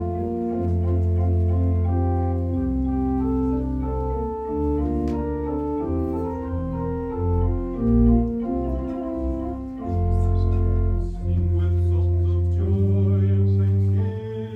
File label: Prelude Soloists and organ